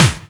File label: clear steel snare drum c sharp key; snare drum samples